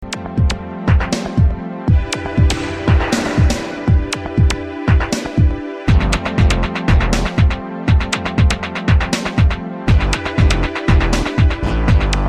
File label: Beep Beep Boogie